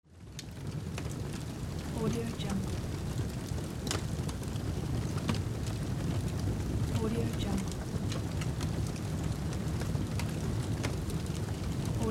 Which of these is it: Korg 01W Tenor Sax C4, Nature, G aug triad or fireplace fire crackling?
fireplace fire crackling